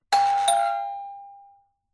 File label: doorbell; la paz; your free sounds